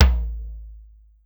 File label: Tight Tom Mid, Pitched Down; Clavia DDrum-AT